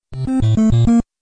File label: Notify; Mario